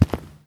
Concrete Footstep

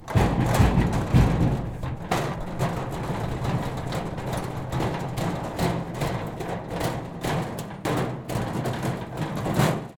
Old Metal Garage Door Opening

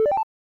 Videogame Menu Select

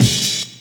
Crash

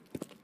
Footsteps Stone

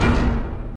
Stab

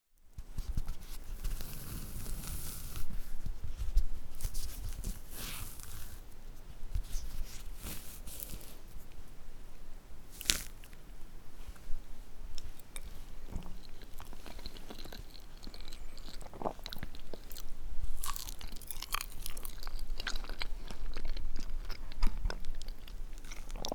Eating A Banana

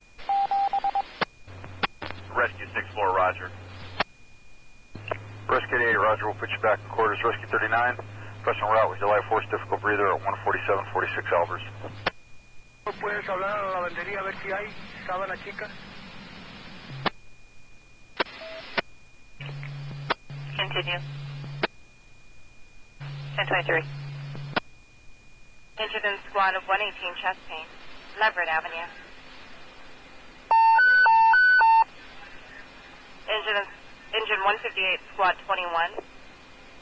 Police Fire Ems Random

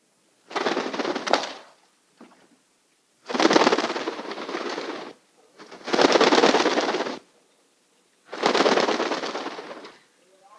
Bird Wings Flapping Quickly Medium Size

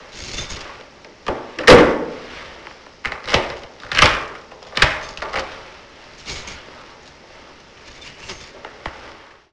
Door

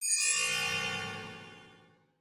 Whoosh Tonal Build Up Reverb Bell Heal

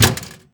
Collision

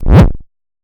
Attack Zound

